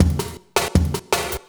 Loop, Drum & Bass, 160 BPM

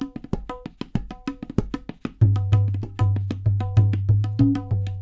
LOOPS, Percussionworks; Sampleheads; NYC Percussionworks; 90 s Sample CDs

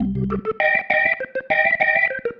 Single Riff, Organ

